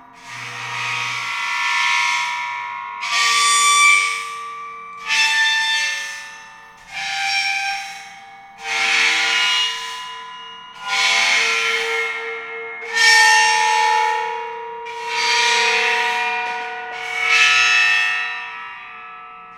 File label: bowed cymbals; metallic